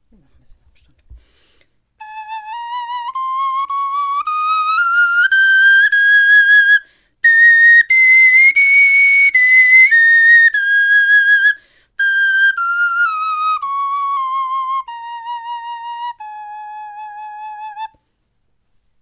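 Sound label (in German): tonleiter, Knochenflöten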